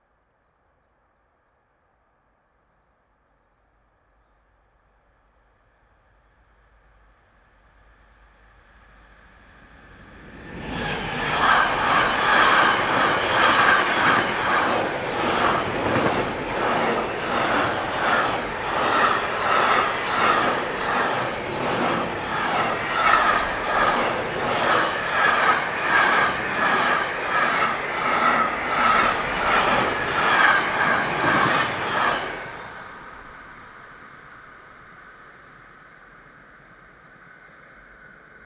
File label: freight train fast whooshes